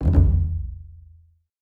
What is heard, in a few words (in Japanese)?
太鼓・ドドドン 速め